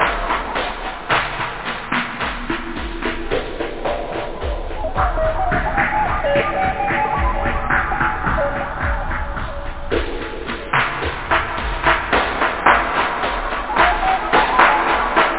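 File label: phase, techno bass drum; da capo vol1; loop house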